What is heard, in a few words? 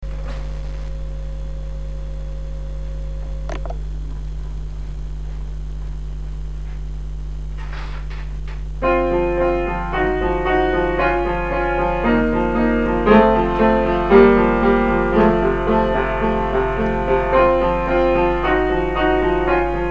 proke piano